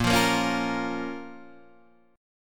Bb Minor, Guitar, Half Step Down

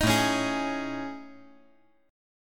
A Major 7th Flat 5th, down

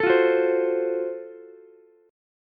Gb Minor Major 7th Flat 5th, Ukulele; strummed; 14,17,14,15; JGuitar

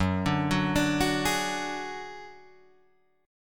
F sharp Suspended 2nd Sharp 5th, Guitar, Drop D

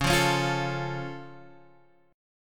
C sharp Augmented

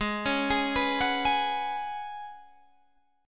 Db 7th Suspended 4th Ab, guitar

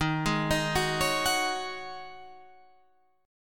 Ab 7th Suspended 4th Eb

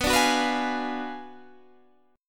B Minor 6th Sharp 11th B

